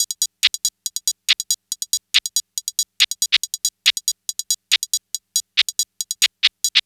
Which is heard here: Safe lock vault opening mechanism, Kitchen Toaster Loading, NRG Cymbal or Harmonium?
NRG Cymbal